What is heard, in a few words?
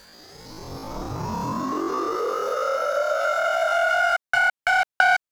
Riser; M.I.L.F; Riser SFX